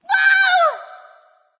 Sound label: fear; smite; arachne